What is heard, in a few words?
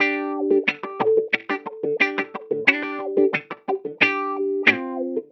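Hip Hop Guitar G major; Digidesign; Pro Tools; Pro Tools Essential Loops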